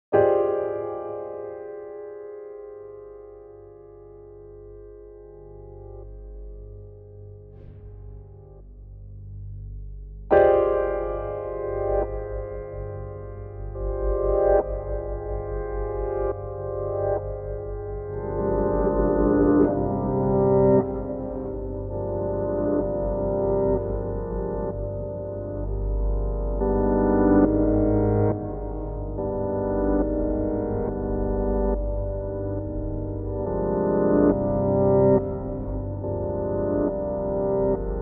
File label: Ominous